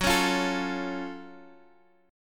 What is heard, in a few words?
F sharp Minor Major 7th F sharp Guitar Half Step Down